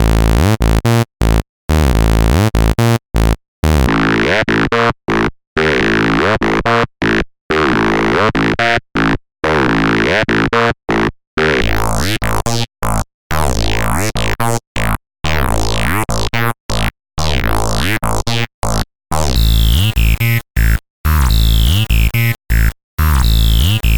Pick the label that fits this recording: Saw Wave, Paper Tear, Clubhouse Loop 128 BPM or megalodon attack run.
Saw Wave